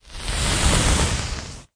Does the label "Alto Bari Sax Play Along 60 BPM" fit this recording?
No